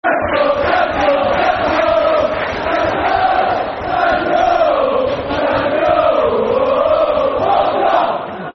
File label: Time To Go [Football Chant (Soccer Songs)]; Preston North End Pne